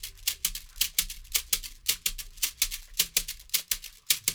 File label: African Shaker Mini, Percussion; World